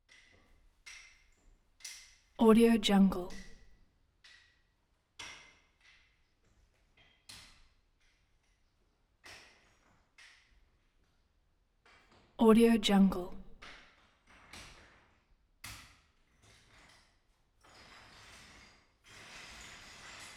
picking up tile

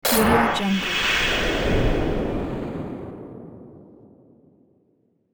industrial power glitch

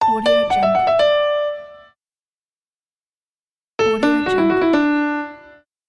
funny piano logo

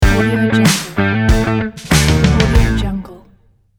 classic rock logo; audiocustle

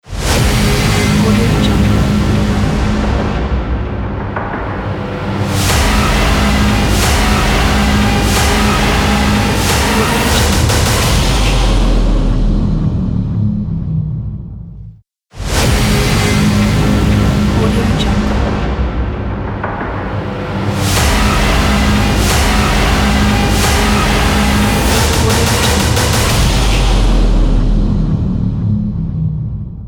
intense hybrid ident

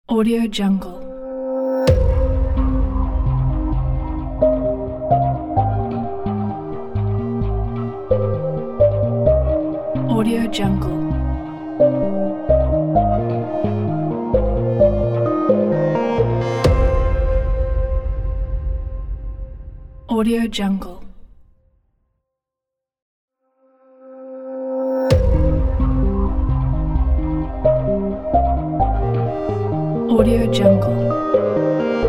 crystal technology logo

